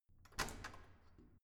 corridor door open hard

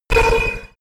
sci-fi laser shot hard; krieg-waffen; soundpool

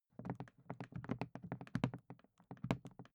briefcase plastic handle handling soft; industrie wirtschaft; bueroarbeit

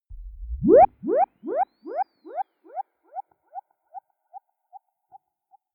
synthetic hits; swooshes-swishes

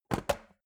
metal box small close; maschinen; soundpool

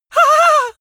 male afraid squeal; vocals; comedy-cartoon; soundpool

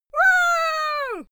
female cheer woo

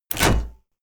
sci-fi mine place; schusswaffen

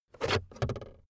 car backseat table pull out cupholder

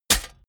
metal hit clank bright

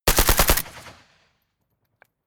rifle ak-47 exterior fire burst close; Firearms; schusswaffen

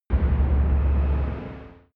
spaceship engine start fail light; raumschiff

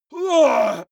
male groan strain

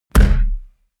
heavy saturated, hits impacts; whooshes rises

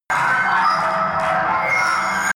screech reverb, sciene fiction

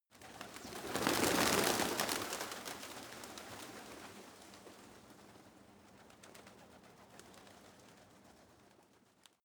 pigeons flock rise soft; natur-tiere; voegel

